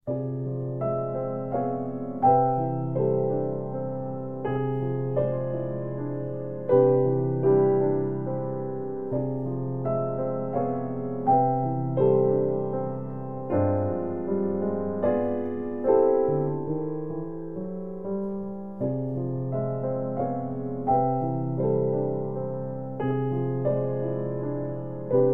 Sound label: piano solo